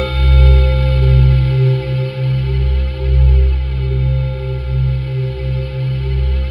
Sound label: C2, Synth Leads; 90 s Sample CDs; NiceBeats (NiceBytes); Studioline 09